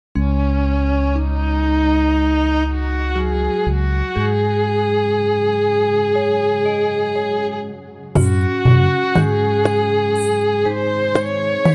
Instrumental; Vande Mataram

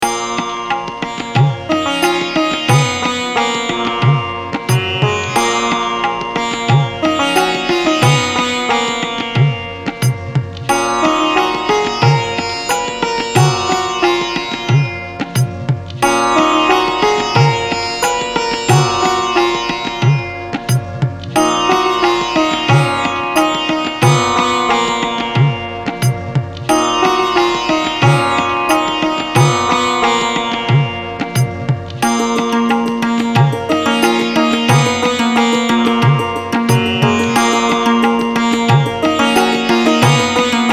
Bhairavi Captivating Indian Classical

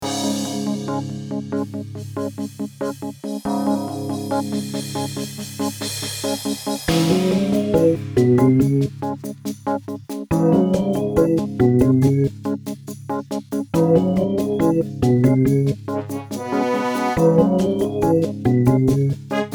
Comic Suspense

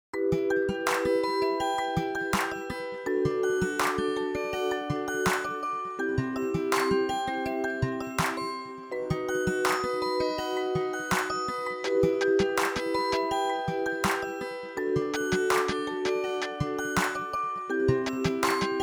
Laid Back Hip Hop

